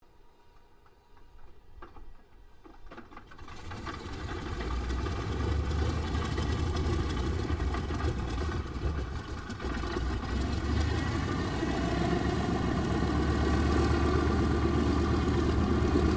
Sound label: AIRCRAFT PBY CATALINA STARTUP, Catalina Flying Boat; Airplane; Vehicles; 1942